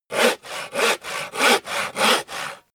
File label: hand saw wood steady